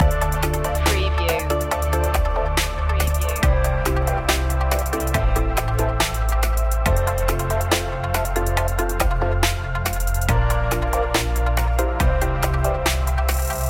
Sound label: glamour downtempo loop; enchanting